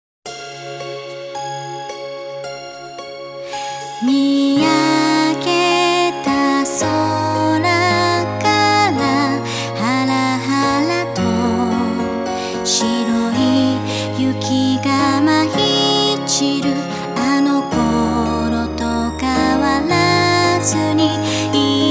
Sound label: melody of the snow